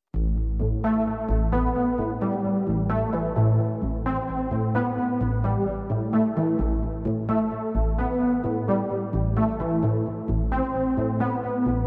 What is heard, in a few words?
curio music